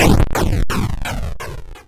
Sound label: Hit the Ground